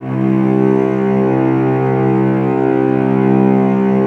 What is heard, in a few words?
String Ensembles Cellos C 2; Miroslav Vitous; 90 s Sample CDs